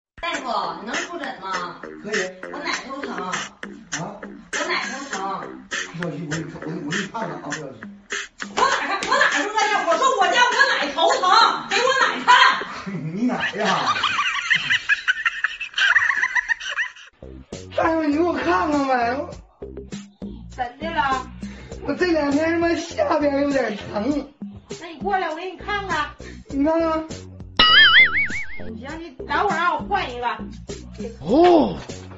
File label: laugh; breadradio